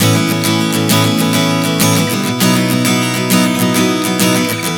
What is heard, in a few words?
Progression 100 Aadd9-Badd11, Acoustic Guitar, Chord Progressions 100 bpm